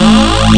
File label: kraft bass growl, Synth